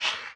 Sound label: notice prop grid Scale